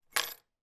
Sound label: household key car electronic put down on sideboard